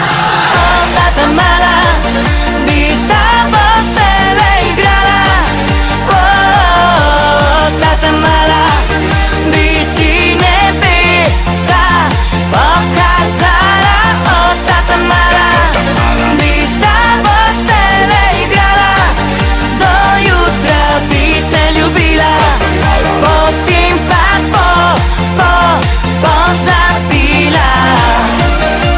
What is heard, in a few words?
tamala vokal